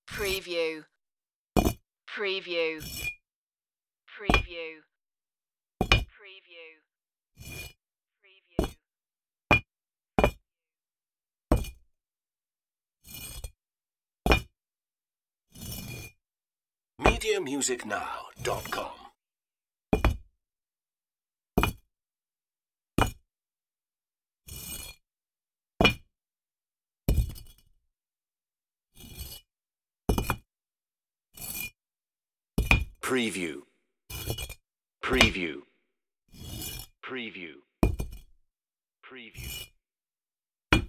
laying bricks

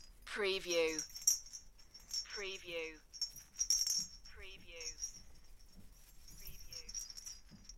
Christmas tree bell decoration; beanstalk audio

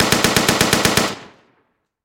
Machine Gun STG44

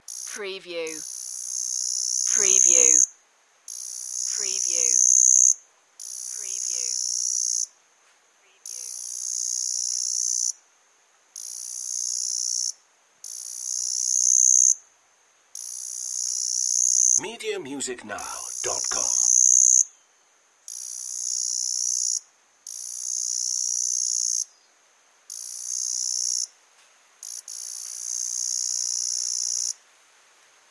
single cicada chirp

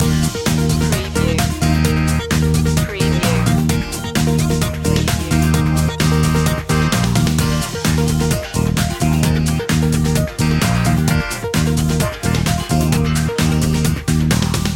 happy shopping loop

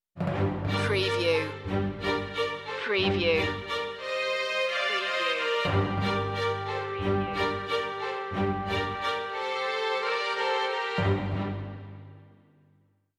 sting no choir

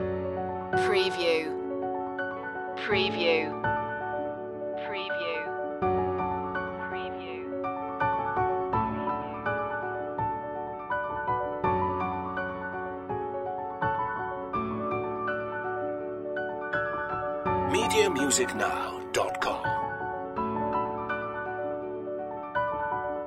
streaks of light upon us loop

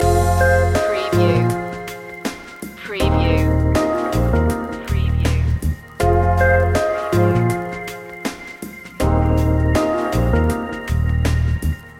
wavebreak loop

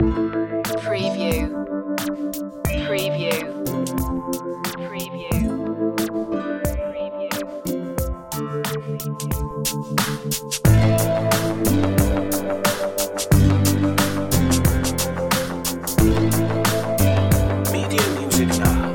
hip hop

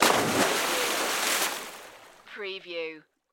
Splash; Sound Effects Bible

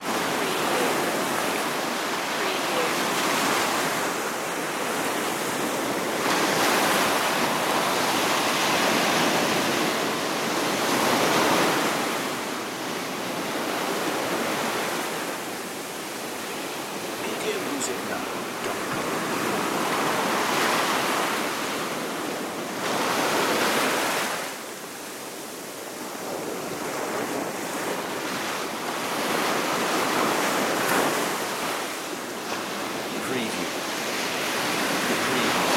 ocean ambience

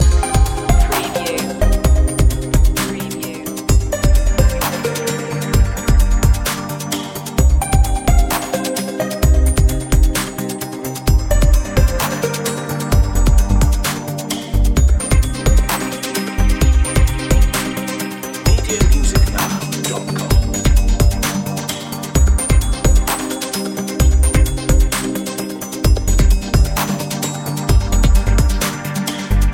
futuretronics loop

